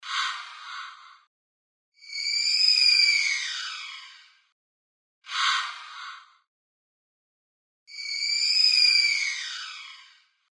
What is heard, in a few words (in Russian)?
Pterodaktil